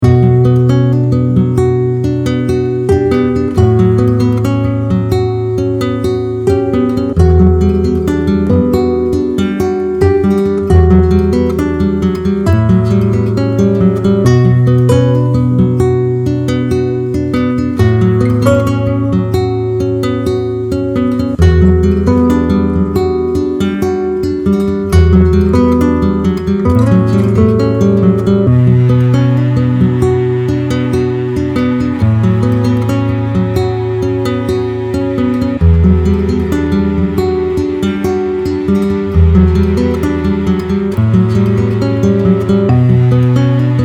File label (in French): Guitare classique Nostalgie